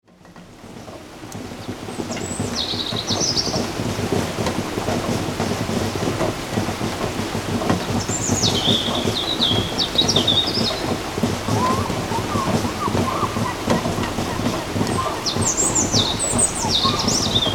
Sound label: morning rain